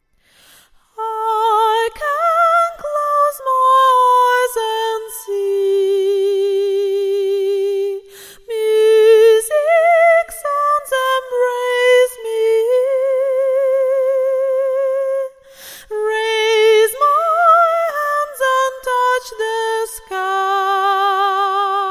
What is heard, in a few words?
ambient pop 120 Am; acapella